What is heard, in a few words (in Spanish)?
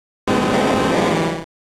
Grito de Onix